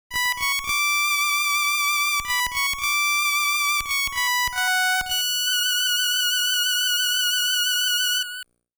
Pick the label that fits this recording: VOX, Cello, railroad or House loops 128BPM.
Cello